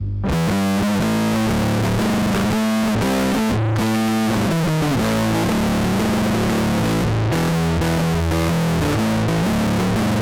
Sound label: fuzz